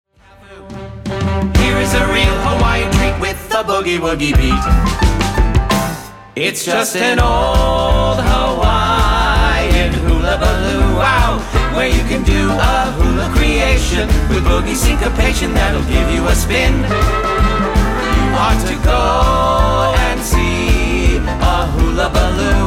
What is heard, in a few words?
Hula Medley; The Andrews Brothers; stage rights